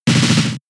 Sandstorm; turn damage; attack moves; Pokemon; gen 6; XY; ORAS

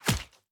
Gunfire Hit; Devour; The Town

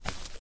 Footstep grass

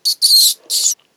rat squeak

